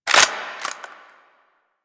Rifle Clip Out; Reloads

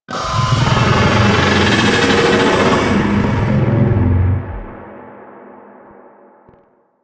Arachnoid Camper Vocal Death; Warframe; Corpus

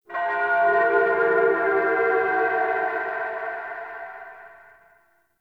Bell Texture; Ambience; One Offs; Champion Crypt

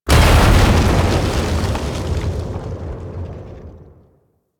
Arachnoid Camper Damaged Bodyfall; Warframe; Corpus; Death

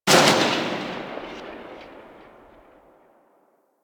Pistol Tail, Weapons; Harlequin; Warframe; Tenno

